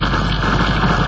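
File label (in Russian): стрельба из лазерного оружия во время атаки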